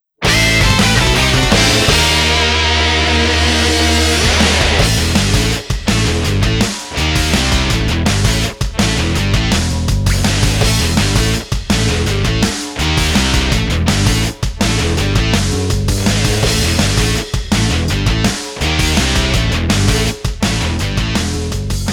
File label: Beat Mix; Deathblow; Shin Sangokumusou 6 Dynasty Warriors 7